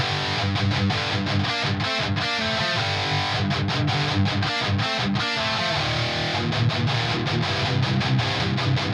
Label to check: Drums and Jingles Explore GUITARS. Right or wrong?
Right